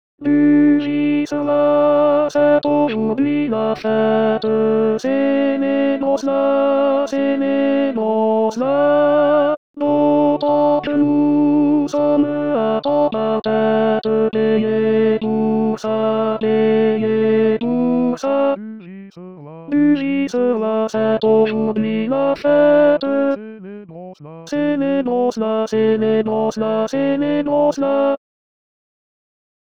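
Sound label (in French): ténor voix accompagnement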